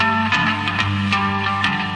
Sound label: love those guitars